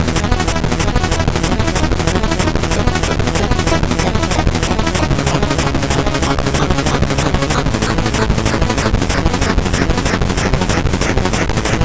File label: sid CSG8580R5; Pick n Pile